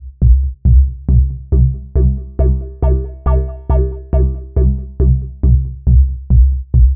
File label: Synth Bass; Basses